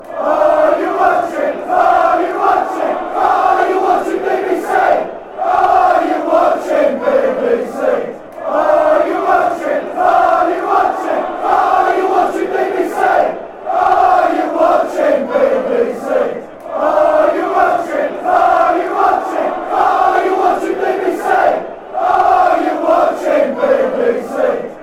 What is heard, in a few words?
are you watching, fanchants